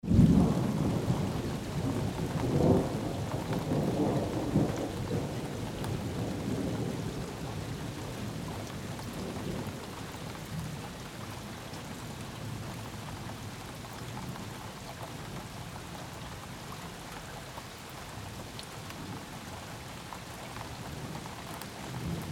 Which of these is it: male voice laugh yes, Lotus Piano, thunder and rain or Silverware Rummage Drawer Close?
thunder and rain